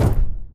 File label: Footstep Jog Mid; Titanfall 2